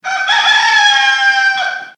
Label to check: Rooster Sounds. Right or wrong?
Right